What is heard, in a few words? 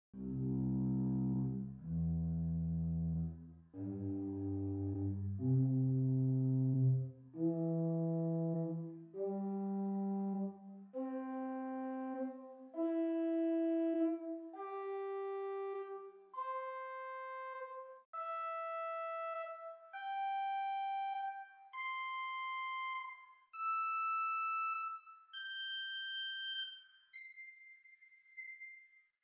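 Swell Geigen 8 arpeggio; St Annes Moseley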